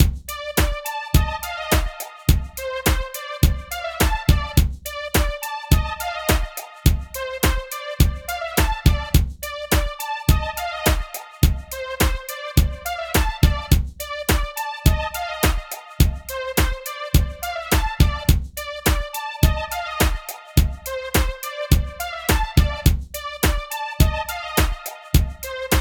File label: Alternative Hip Hop 105BPM Cm